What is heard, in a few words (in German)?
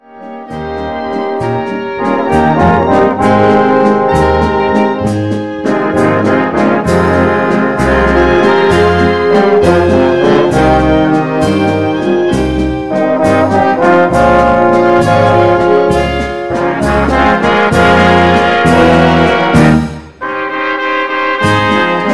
Pariser Walzer Medley